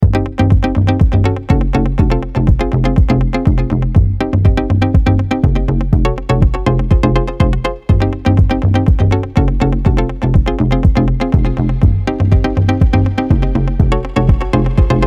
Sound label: chromaphone 3; usura nation; richard veenstra